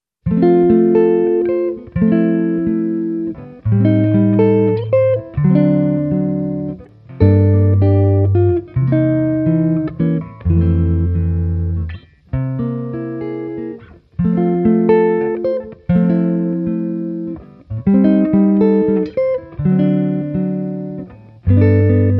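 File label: Parisienne, Guitar Version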